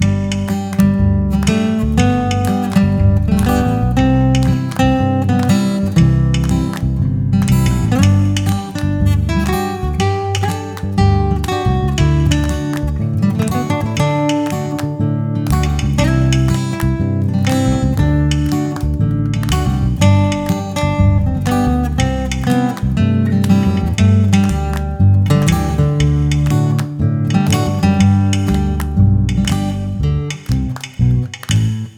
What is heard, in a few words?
payoff music; Kung Fu Chaos; XBOX